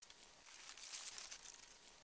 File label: Sunflower Rustle Loop; weapons; aronwk collection; ndaudio